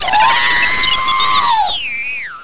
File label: R2 HIT; XWINGTIE